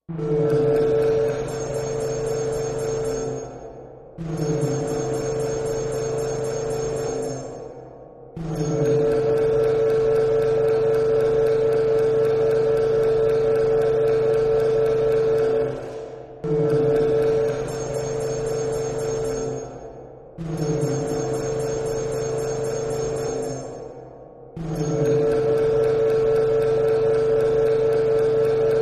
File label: Medical Conditioner, radars